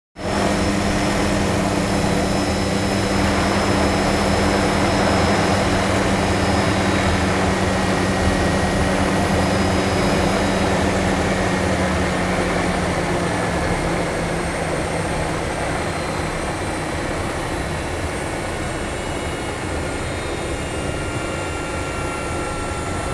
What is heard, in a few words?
Helicopter lands, turns off inside